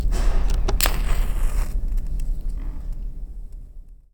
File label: match light